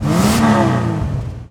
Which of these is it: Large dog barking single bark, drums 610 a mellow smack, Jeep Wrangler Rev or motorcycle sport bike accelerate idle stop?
Jeep Wrangler Rev